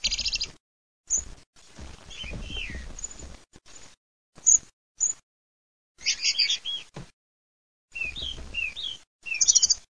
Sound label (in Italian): TORDO